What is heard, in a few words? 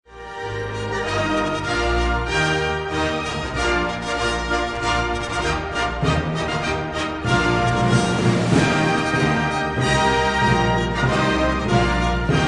Fanfare